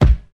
fluffy kickdrum b key, Royality free kickdrum tuned to the B note